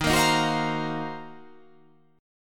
D sharp Minor 6th Add 9th D sharp, chord

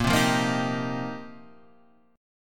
F 9th Suspended 4th Bb, chord

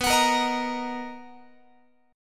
B Major 7th B down Guitar; strummed

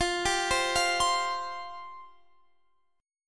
F Suspended 2nd Guitar Drop D, chord

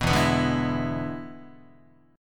E 7th E down Guitar; E7 strummed; JGuitar

